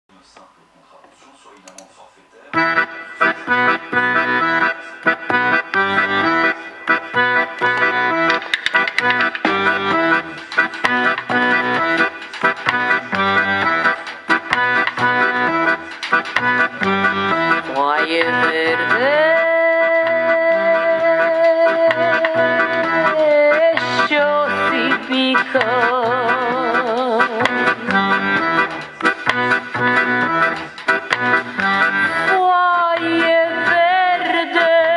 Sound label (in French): rythmique